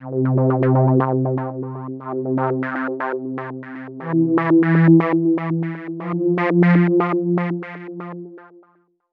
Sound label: Preset Modulation Crossfade